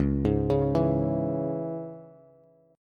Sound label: C sharp Minor, Bass; arpeggiated; chord; JGuitar